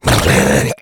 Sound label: rage, infected, male